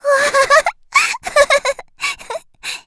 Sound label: laugh; Eternal Return; Tia; Voice Line; KOR